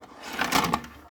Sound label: VHS takeout